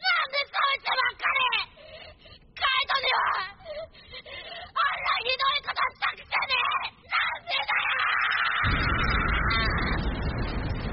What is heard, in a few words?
Screams; Gon; At Pitou